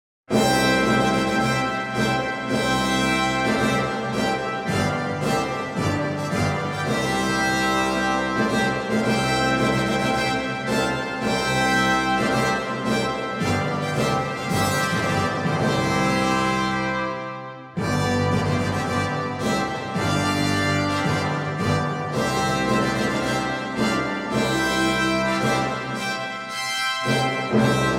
Intrada Brass

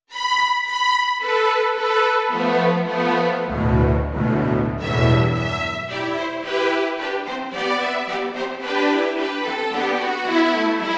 Canon

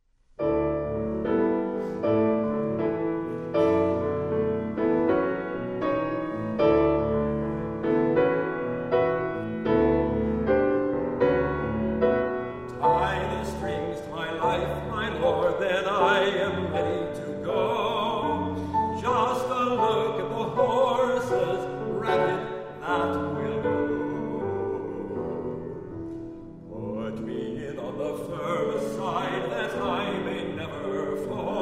pfte

